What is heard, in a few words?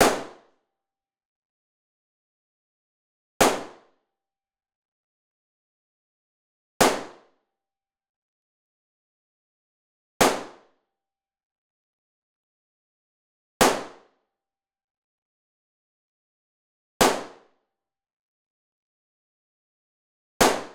Glock 17 9mm - FIRING - Small Living Room - Single Shots - 5m In Front; Pole Position; The Indoor Gun Acoustics 2 Library